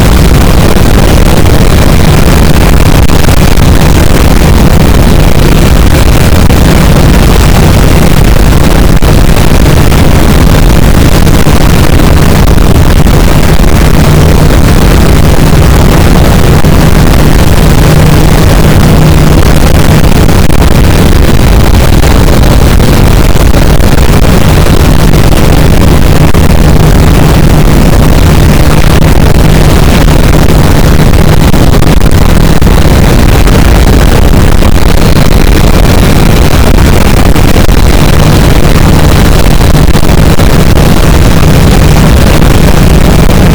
cachalot negatif, boat, Physeter Macrocephalus

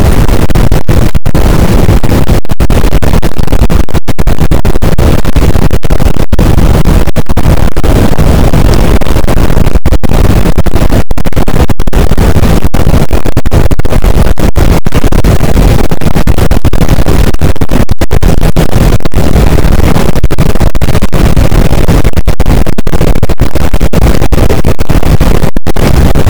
cachalot negatif, boat, Physeter Macrocephalus

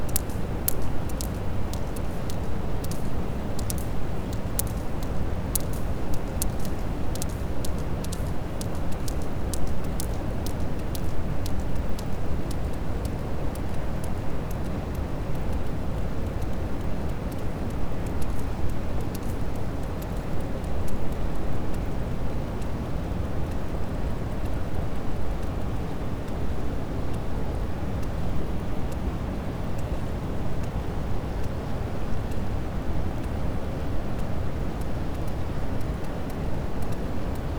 cachalot positif, Physeter Macrocephalus

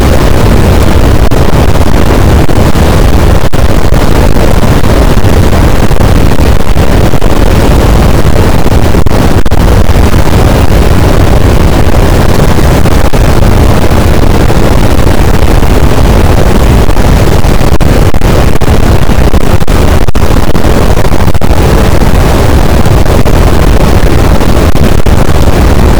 cachalot positif, boat, Physeter Macrocephalus

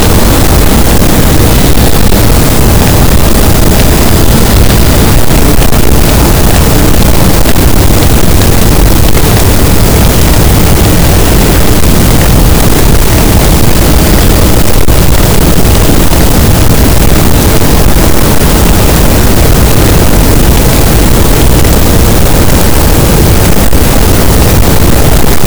cachalot, Physeter Macrocephalus, gaussian noise

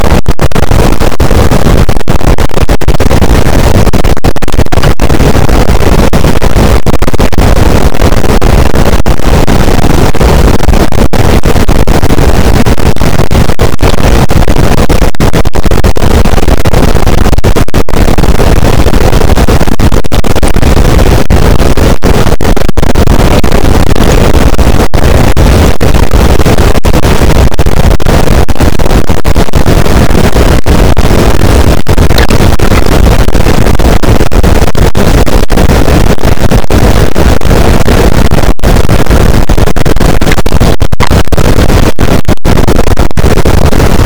cachalot positif, boat; Physeter Macrocephalus

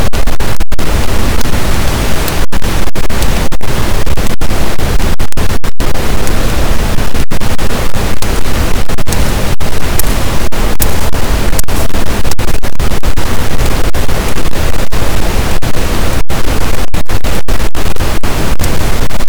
cachalot positif, boat, Physeter Macrocephalus

